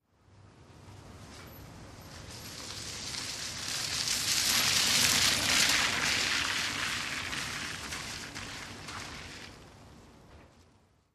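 Car By Slow, close up On Wet Pavement with Engine Off.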